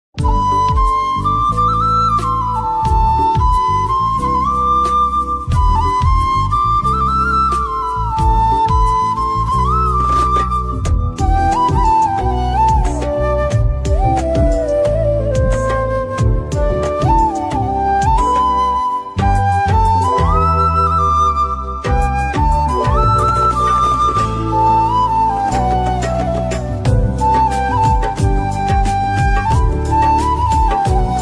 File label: instrumental ringtone bollywood ringtone; srk